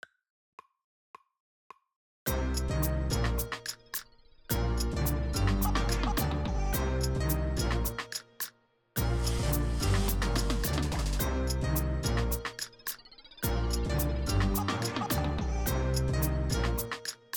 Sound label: magma drumless